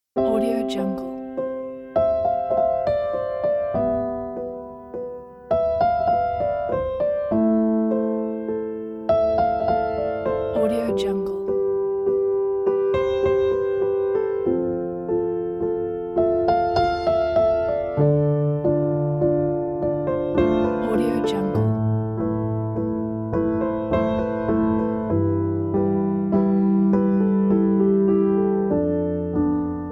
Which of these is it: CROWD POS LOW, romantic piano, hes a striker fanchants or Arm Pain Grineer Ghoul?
romantic piano